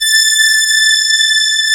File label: CMI ORGAN; Wizoo - Powered DX